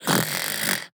Otter sleep loop in

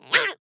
Otter attack

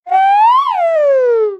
Yawn Voice

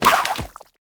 Background Otter attack

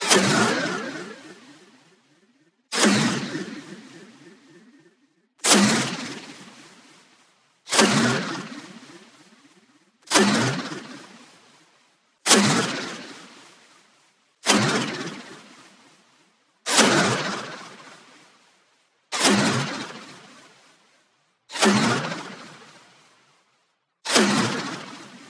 Soul Hop Out Sound

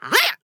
Otter attack

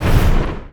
Flare Gun Hit

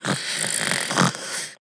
Otter sleep loop in

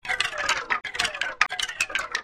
Hurt Voice

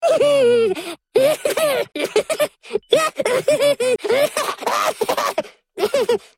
Big Taunt Voice

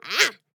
Otter attack

